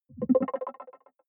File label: button echo marble bubble; soundpool